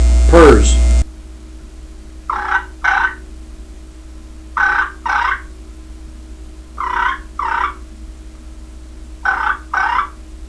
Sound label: ulra purrs, turkey; dixie